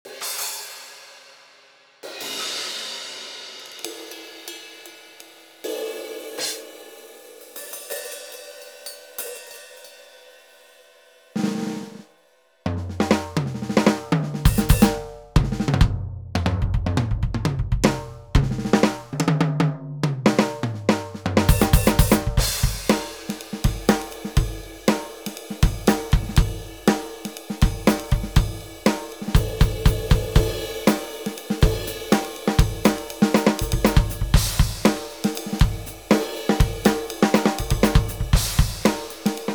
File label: grand vintage 60s; efnote pro